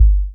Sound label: Sub, drum